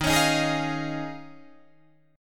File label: D sharp 9th Suspended 4th D sharp, Guitar Drop D